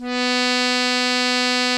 accordion B2; InVision Interactive; Lightware VOL-1; Instruments & Percussions

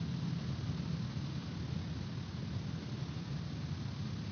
Ambience Loop, outdoor

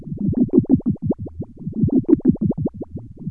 rattle fx; Zero-G Ambient 1

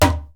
IJEILU HITS; Best Service; World Colours

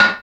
CLANK, INDUSTRIAL

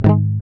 GUI G#, Acoustic

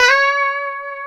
C#5, Guitars; Spectrasonics; Hans Zimmer Guitars VOL-1; 90's Sample CDs; COMP POP+VIB

